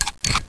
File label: silencer, weapons